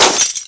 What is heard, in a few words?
shatter; RallyC